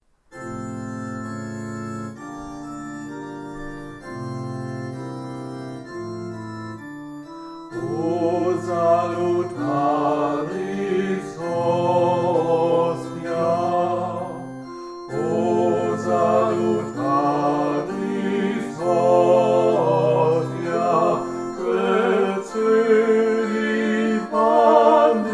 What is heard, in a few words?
duett oh salutaris hostia